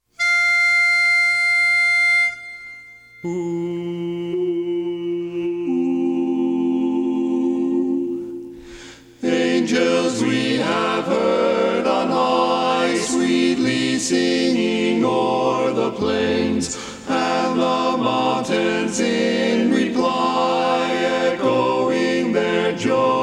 Angels We Have Heard On High Baritone; Christmas

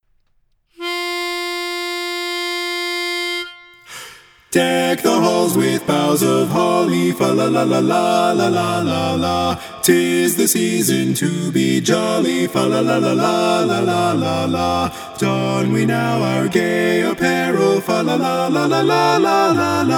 bari missing; Deck the Halls

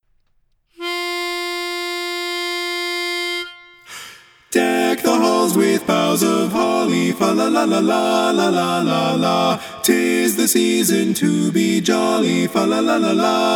Deck the Halls bass missing

